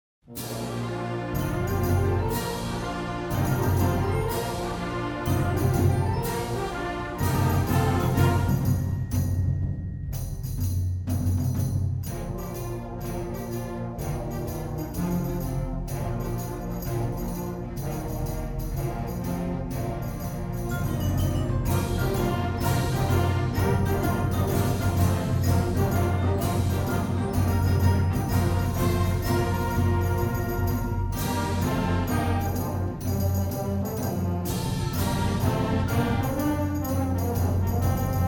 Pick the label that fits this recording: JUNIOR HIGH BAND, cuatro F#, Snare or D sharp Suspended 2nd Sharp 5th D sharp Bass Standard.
JUNIOR HIGH BAND